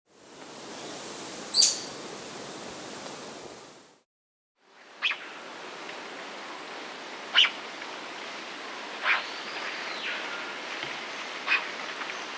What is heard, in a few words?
Chestnut-Crowned Gnateater, Nature's Songs, S. America